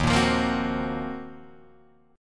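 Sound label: E flat Minor 6th Add 9th, Guitar, Open D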